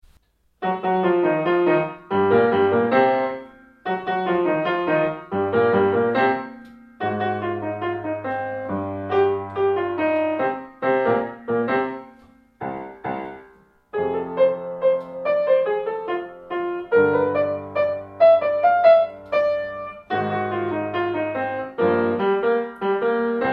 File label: Vampire blues